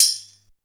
verby shaker percussion f key; tuned percussion

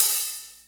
juicy open hi hat sample d key

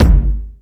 subtle reverb bass drum g sharp key

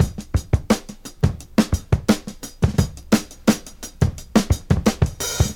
115 bpm drum loop sample A key

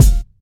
short bass drum G key

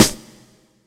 verby snare sample c sharp key

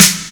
treble heavy acoustic snare sample g key

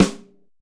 verby snare sound b key; snare drum samples

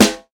present acoustic snare a key

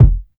original kick single hit F key; kick drum samples

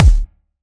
bumpin kickdrum sound d sharp key; kick drum samples

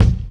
studio kick drum sample c key

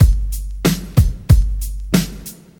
93 bpm drum beat g key; drum loop

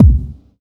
reverb kick drum one shot b key; Duration 0:01

